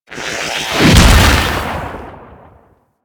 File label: Radial Blast; Warframe; Captain Vor